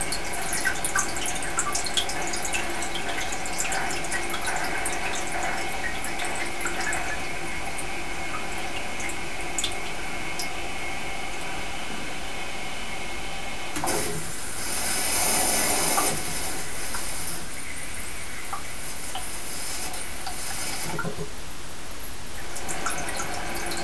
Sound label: toilet running